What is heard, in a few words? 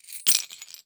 Coins Bottlecaps Drop; Shapeforms; The Mint – Coins and Money